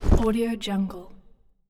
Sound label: cloth item